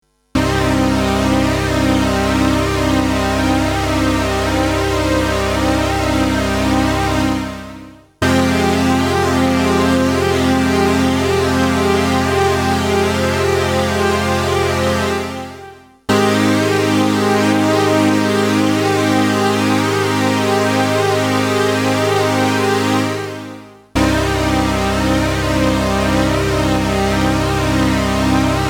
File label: Boss CE-300